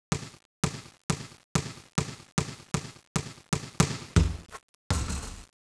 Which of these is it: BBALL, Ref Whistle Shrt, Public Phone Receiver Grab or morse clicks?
BBALL